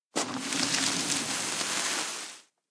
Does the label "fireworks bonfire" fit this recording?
Yes